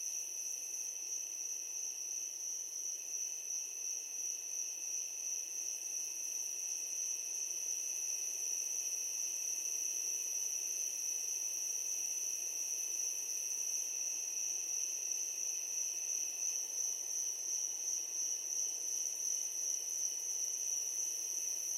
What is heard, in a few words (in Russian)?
letnyaya nochnaya atmosfera